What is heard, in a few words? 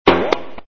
Boing